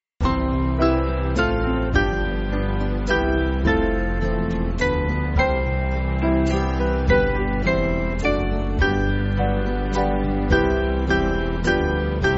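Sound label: Band, Music Backing Files for Churches; Make Songs Of Joy; Zpivejmez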